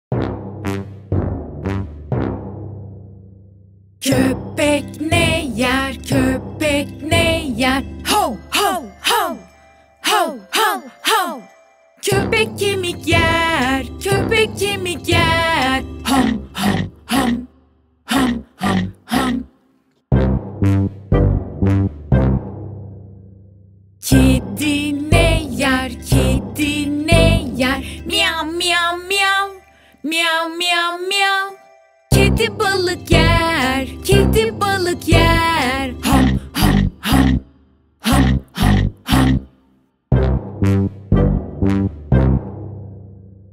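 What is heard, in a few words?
hayvanlar şarkısı kids songs nursery rhymes; kopek ne yer; pampam ailesi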